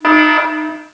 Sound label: alarm